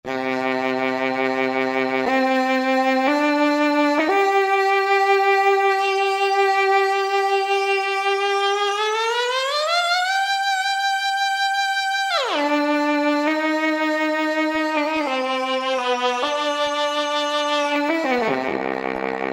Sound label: Roland V-Synth; DBeamFrmntVn; Factory Patches